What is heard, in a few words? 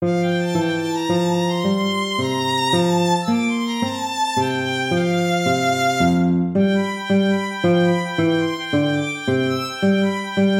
minuet; mozart wolfgang amadeus